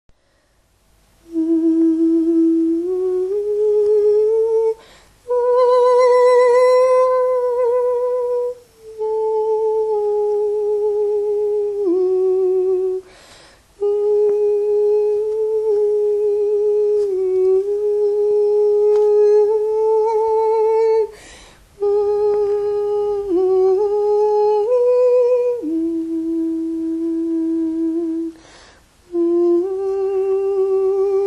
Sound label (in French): soprane; oltra a la nanita